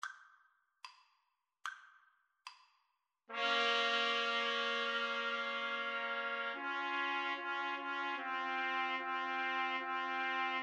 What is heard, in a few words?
beethoven 7 2nd, Trumpet Trio